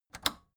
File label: record player power click off fast